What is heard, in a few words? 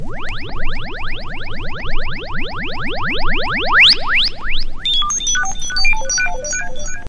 Electro FX; Ueb HE Electro FX